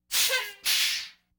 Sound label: Laugh tracks